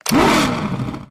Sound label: ui btn next tyres; lightning speed